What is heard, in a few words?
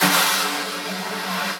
SNARE brushes sweep